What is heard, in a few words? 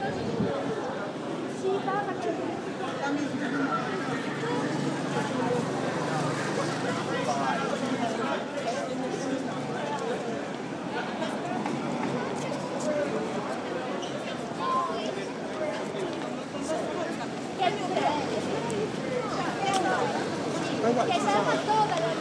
coventry garden